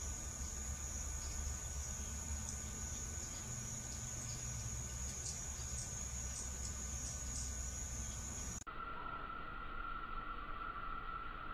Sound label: Nyctibius jamaicensis Northern Potoo, Veracruz; Nictibio Jamaicano; Ibijau jamaïcain